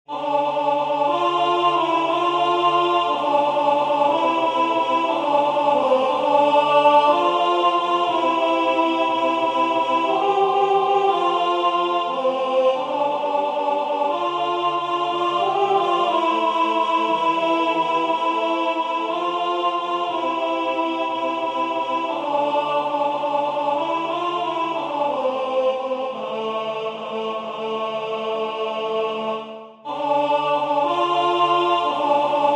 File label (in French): Je vous salue Joseph alto; Du Jonchay